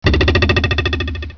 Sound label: magic